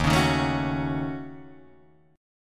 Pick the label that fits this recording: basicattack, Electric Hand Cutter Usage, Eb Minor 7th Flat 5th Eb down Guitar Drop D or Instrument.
Eb Minor 7th Flat 5th Eb down Guitar Drop D